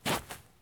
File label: stop grass low; Escape from Tarkov